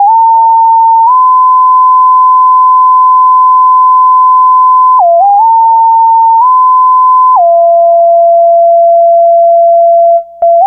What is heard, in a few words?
SYNTH LEAD; Keyboards; Leads